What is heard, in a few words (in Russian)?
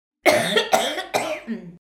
zvuk kashlja